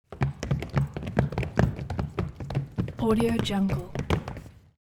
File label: foley step group small run stop wood; smartsoundfx